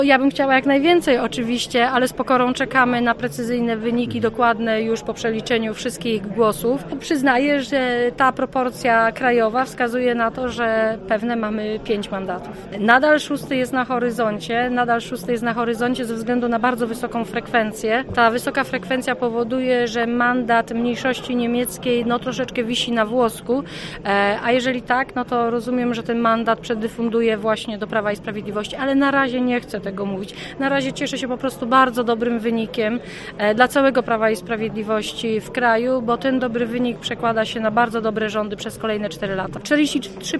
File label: viola